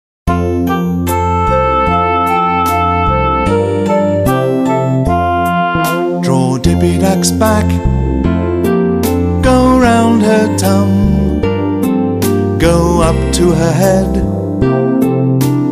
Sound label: Handwriting Song; 레터랜드